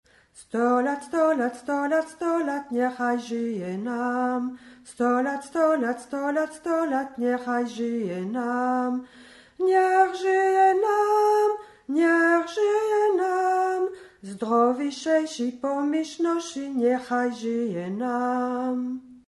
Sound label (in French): Soprane; stolat